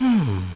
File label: humm; BattleSNDS